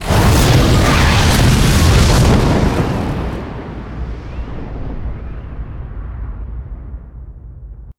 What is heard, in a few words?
explosion huge